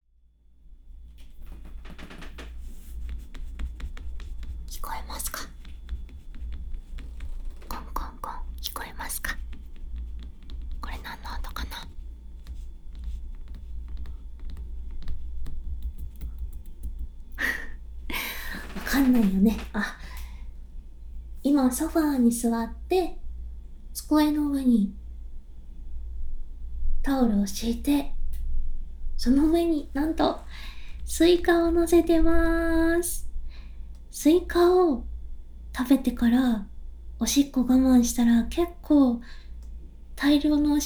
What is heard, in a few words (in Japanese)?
スイカASMR